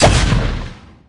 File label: gun shoot; iris watson